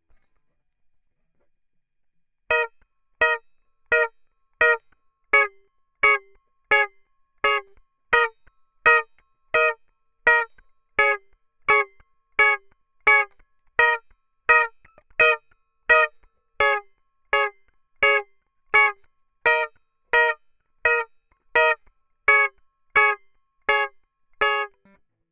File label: strumming; logic